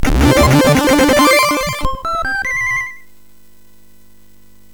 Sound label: sfx, MOS 6581; MOS6581R4; hvsc